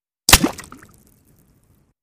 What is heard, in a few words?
Bullet Impacts Water